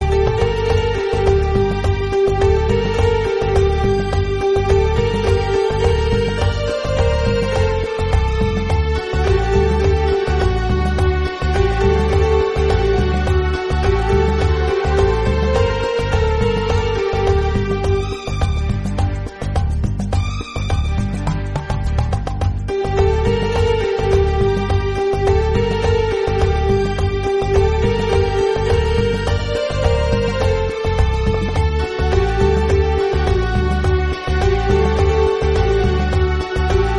background music instrumental; khoon bhari maang

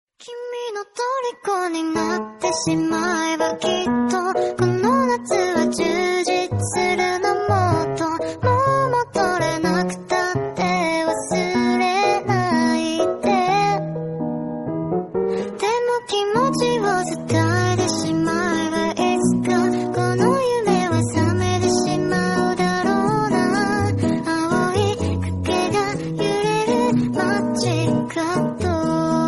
kawaii anime instrumental

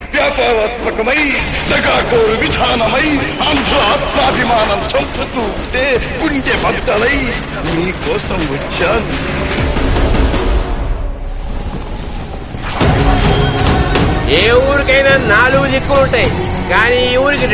telugu song

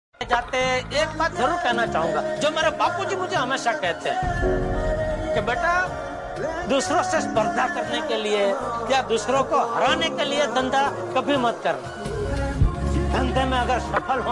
bgm remix; jethalal

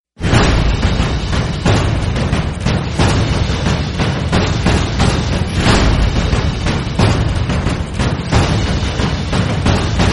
gothic drums

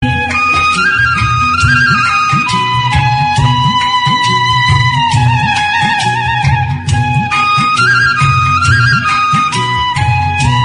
heart touching flute bgm

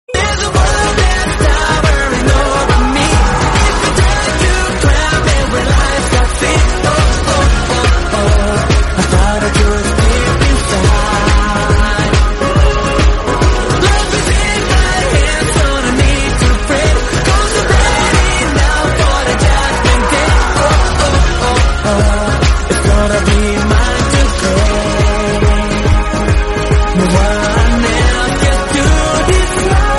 english; Dragon Ball Super Broly; Blizzard

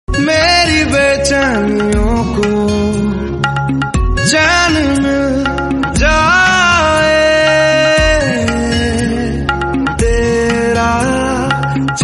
guitar cover instrumental bgm hindi

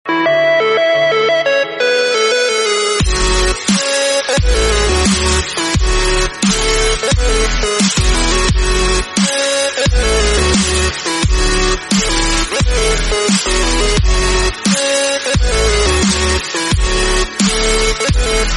edm

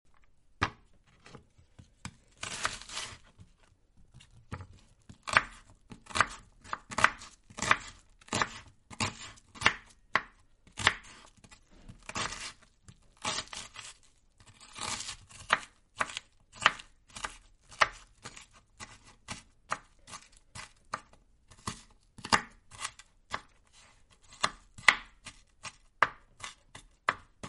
vegetable cutting sound chopping sound effect

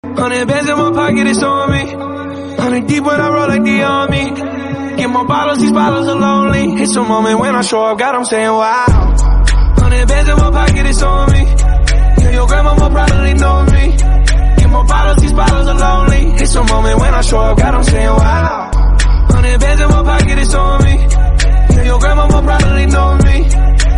english

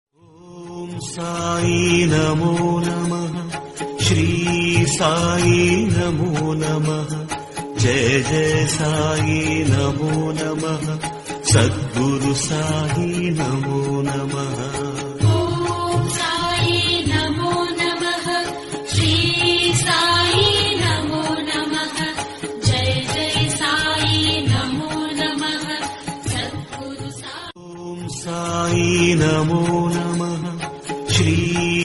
song, Om Sai Namo Namah; Shirdi Sai Baba

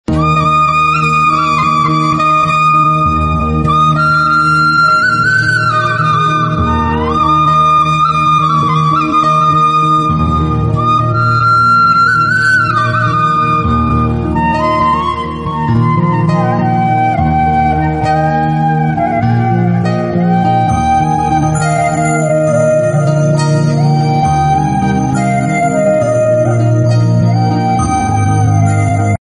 malayalam flute bgm

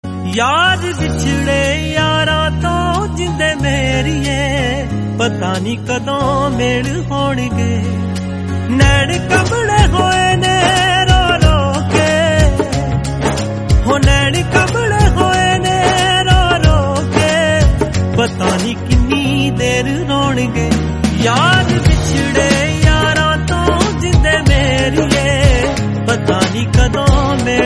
punjabi song

